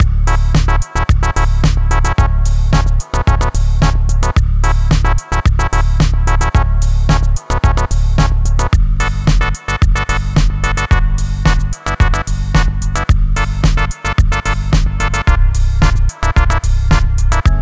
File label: basic 707 snare; gross beat